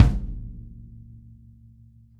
kick; Sonic Reality

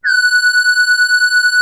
SQUASAR F#5, LEAD; Music Store - Claudius Diemeter Schwerlos

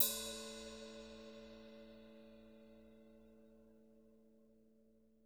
hat, drum; 90s sample CD

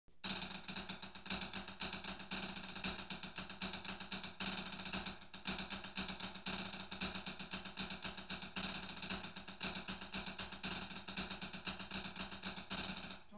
STIX ON FLOO

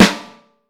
Snare Drum; Q Up Arts; Johnny.C's Vintage Snare Drum; 6X14

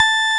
Roxi A, Roxichord; RMI E.Piano; Keyboards of The 60's and 70's

